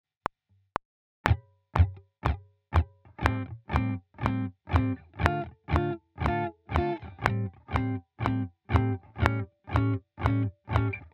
blues backing

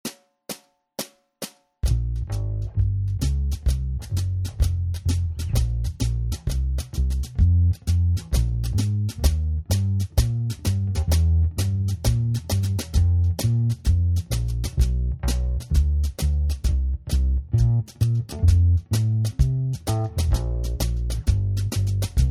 blues, guitar

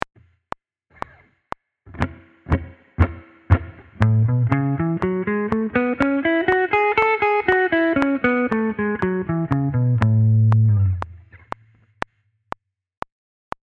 blues track

